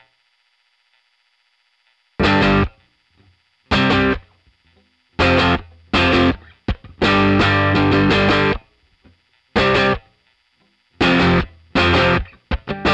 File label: guitar amp